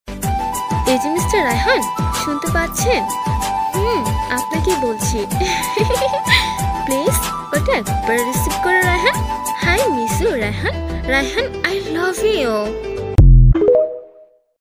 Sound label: bangla ringtone love ringtone; raihan name ringtone